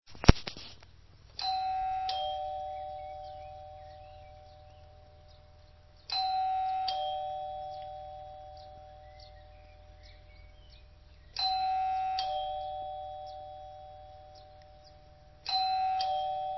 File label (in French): timbre